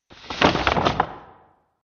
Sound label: Pirate Treasure Map, Cartoon Sound Design Sound Effects